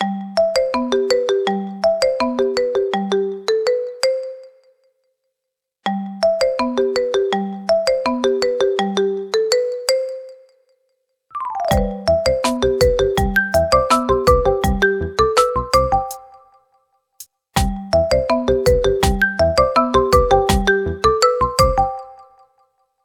Leaping Elf, ringtones

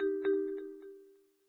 battery low; Meitu; V7

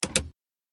Unlock

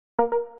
Low Battery; China Mobile; A3s

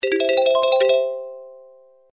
Basic Tone; Samsung; SCH-U680 Convoy 3

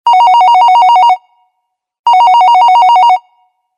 Ring, ringtones; Qiku; Luna Youth

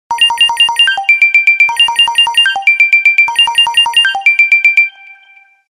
Xylophone bell; Samsung; GT-S3653 Corby India

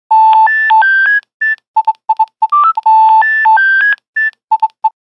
Groove, Nokia Buzzer; Windows Phone apps

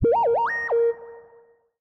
Electronics, notification; Tinno ATT Radiant Core

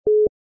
Network Call Waiting

